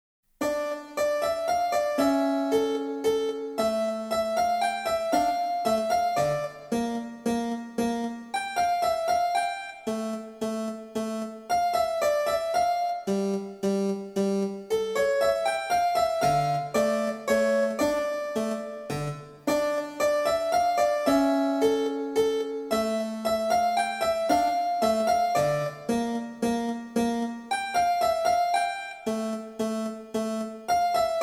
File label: menuet